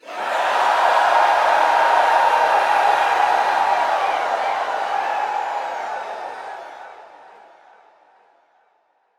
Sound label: Heavy Cheer, Crowd; Lunaro